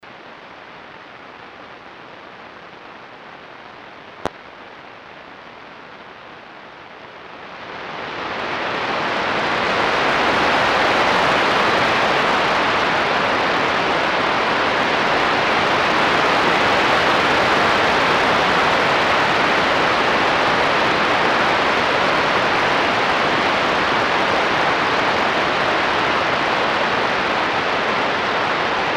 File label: Sun Type V; Ashcraft